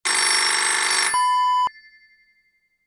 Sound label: telephone ring